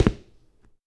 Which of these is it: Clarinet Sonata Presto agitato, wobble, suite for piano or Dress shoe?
Dress shoe